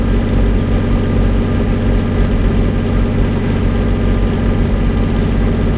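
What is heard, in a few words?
cromwell engine interior rpm 66 load; cannons; War Thunder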